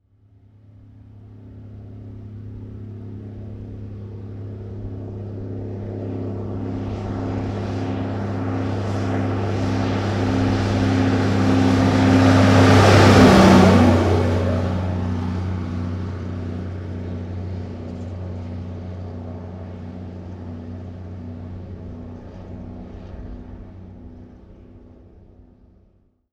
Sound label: 1954 Big Rig Ext Medium By; Period Vehicles